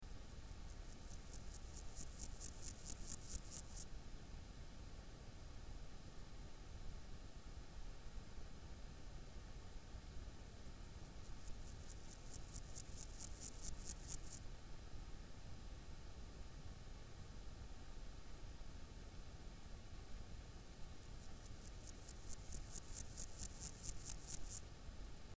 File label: Pseudochorthippus parallelus; BIOAC ORTH